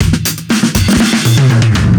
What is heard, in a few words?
drum